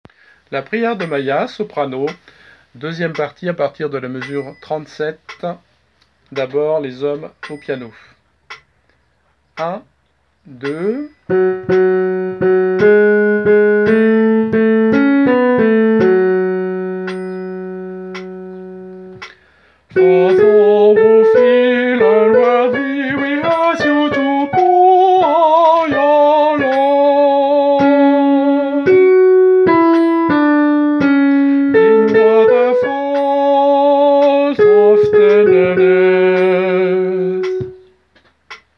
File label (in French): soprano